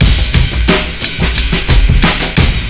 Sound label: amen